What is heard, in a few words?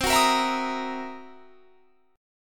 C 7th Sharp 9th Flat 5th, C, down; C7#9b5; strummed